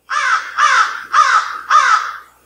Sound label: corvus